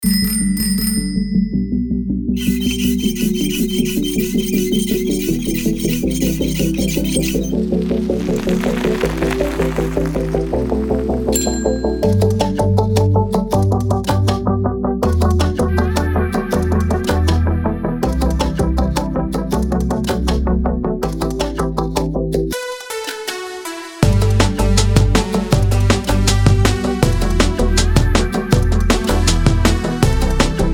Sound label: MUSIC BED CYCLING LIFESTYLE HAPPY